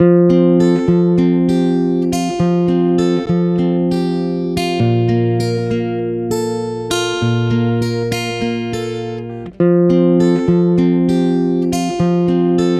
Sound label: Guitar 100 BPM (Fmaj); Inhale; Ghosthack; Guitar Loops & Shots